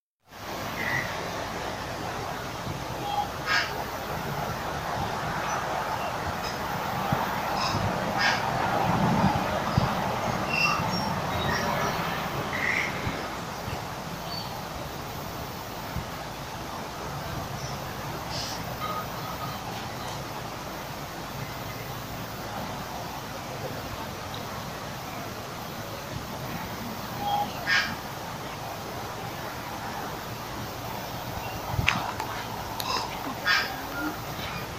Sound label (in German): vogelstimme; Neuseeland